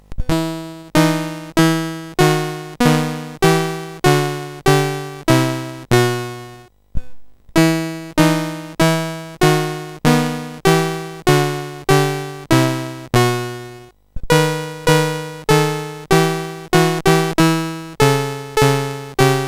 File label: Menuet BASIC, CSG8580R5; Menuet Visee